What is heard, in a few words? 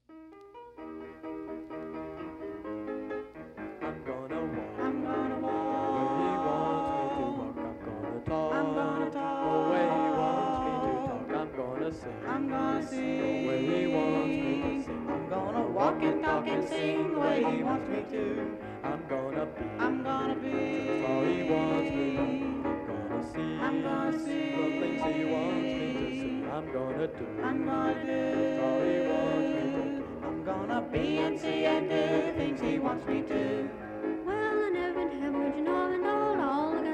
young mens quartet